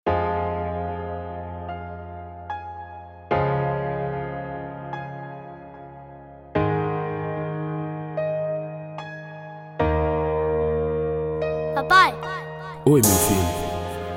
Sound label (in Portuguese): rap